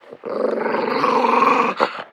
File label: dog growl; Apotheorasis